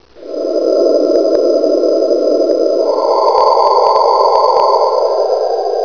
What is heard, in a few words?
wind